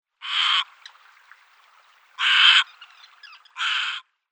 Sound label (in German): Seidenreiher Egretta garzetta; Vogelstimmen